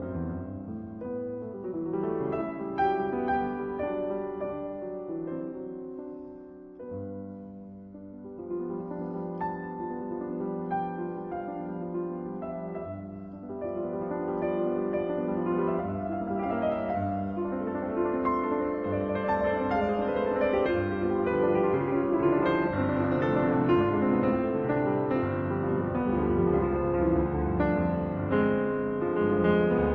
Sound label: Fantasie in C major Durchaus phantastisch und leidenschaftlich vorzutragen; Op 17; Juana Zayas